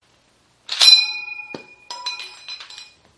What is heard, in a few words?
Garand Ping